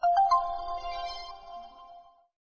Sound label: level sucess; lines